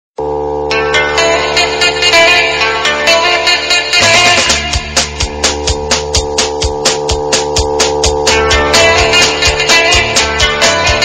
Instru

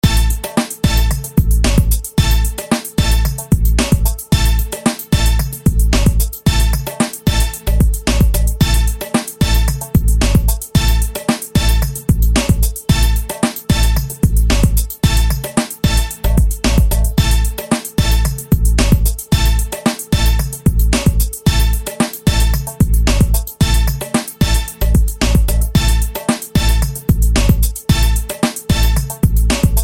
BASS TURBO

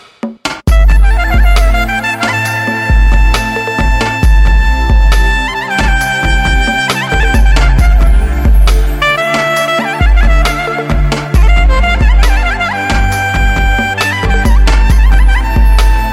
armenian clarinet

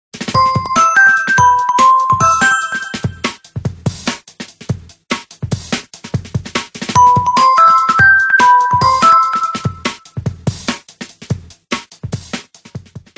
Window Phone

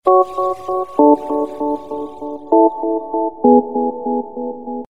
Cute Sms

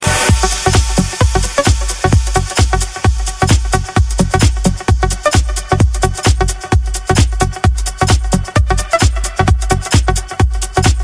Super Cool Electro

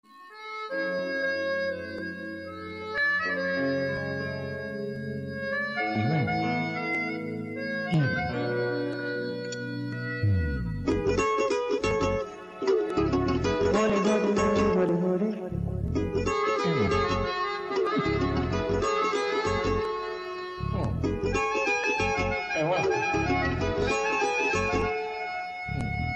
Sad Music

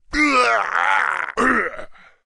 Hyuhhahuaablughh

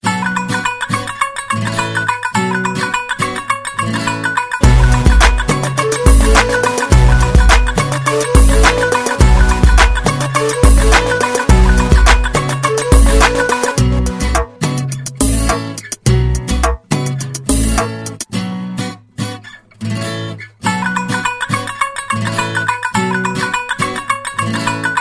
Punjabi Tumbi; meloboom

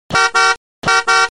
Car Horn

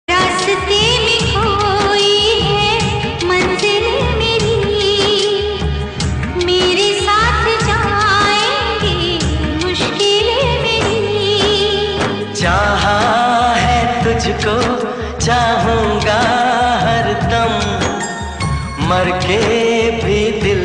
Sad Duet

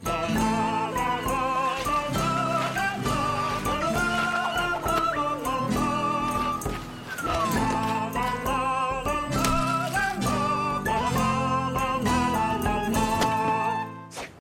Viking Song

